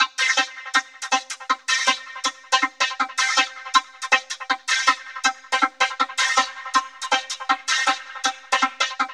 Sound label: fast vocoder; Zero G; Malice In Wonderland